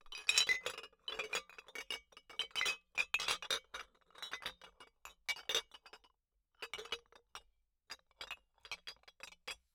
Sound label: Bottle Struck